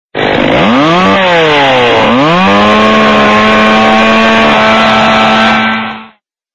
Chainsaw

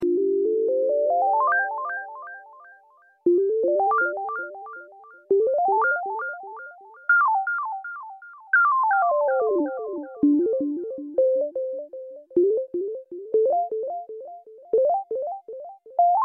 Android Sms; Android 40

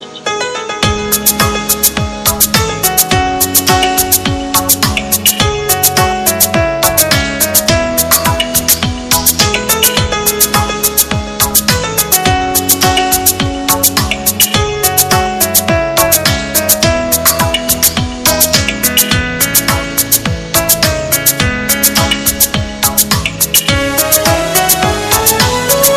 Veer-zaara Remixed

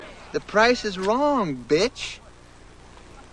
Happy

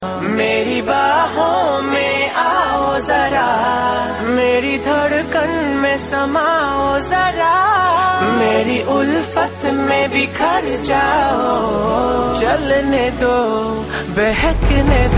Romantic Tone

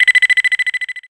Fx Message

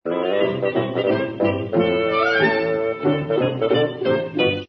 looney jingle

